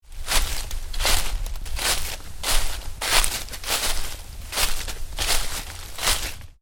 Footsteps damp leaves, Shoes: sneakers, walking on damp leaves; Stuart Duffield